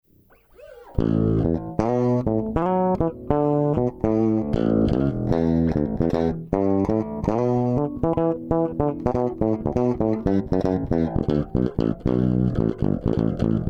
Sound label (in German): 670 PlugIn E-Bass; Jack Joseph Puig; Bogart